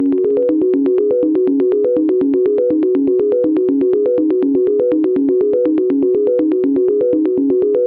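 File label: 122 BPM Arps Flux Arps B major G minor Tonic F; mmb studio melodic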